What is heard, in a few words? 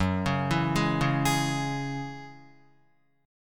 Gb 7th Suspended 2nd Gb down Guitar; Gb7sus2 arpeggiated